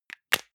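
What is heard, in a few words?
deodorant can lid close hard, badezimmer; haus-garten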